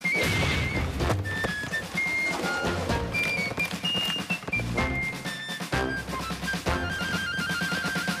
Recess Whistle

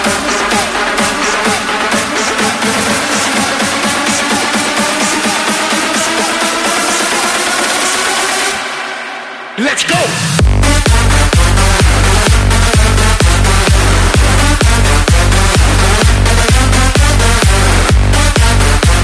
Overdrive

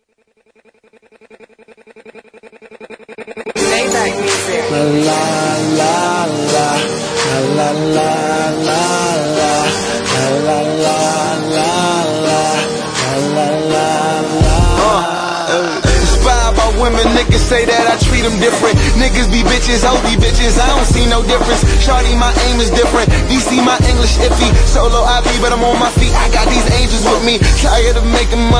applause

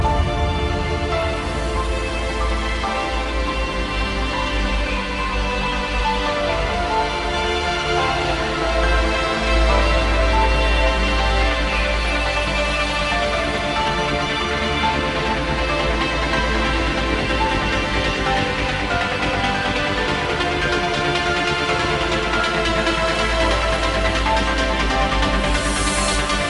UPLIFTING TRANCE

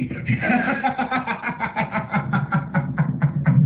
Army Laugh

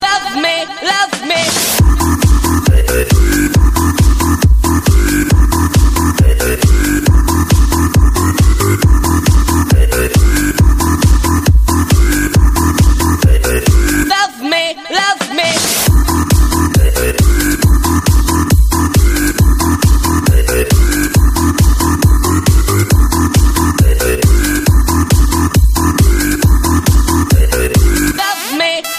electro love song, ringtones electronic

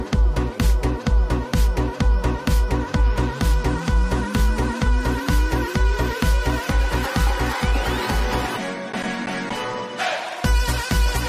boop de boop

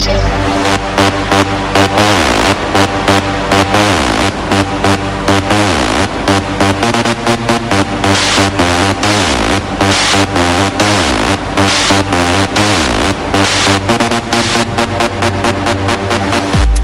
ELECTRO

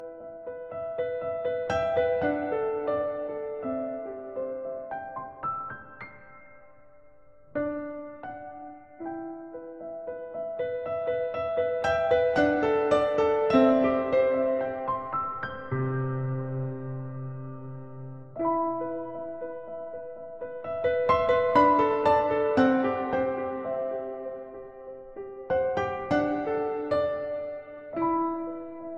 piano mix; rnb

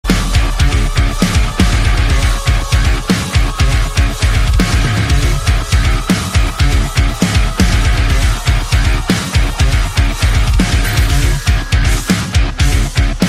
Mangled Guitar

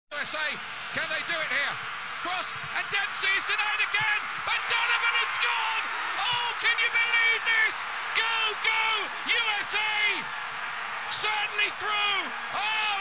Rap

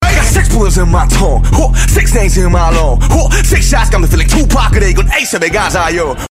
Monster Rap

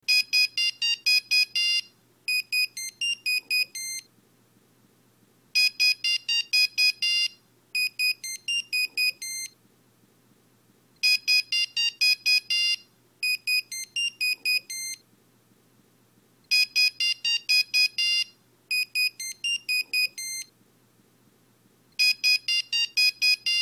nokia playground, sms; ringtones

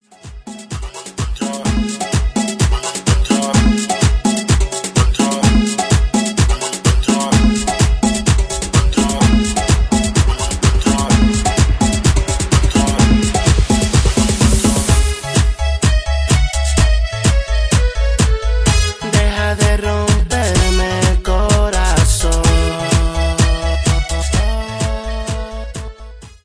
mambo remix

